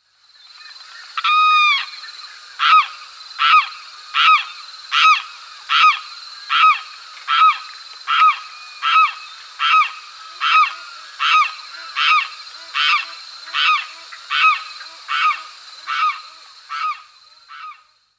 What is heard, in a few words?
Limpkin [Aramus Guarauna]